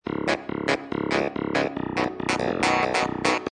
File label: Ska Clav C#m; Lounge & Reggae; Loopology; Ska Till Ya Drah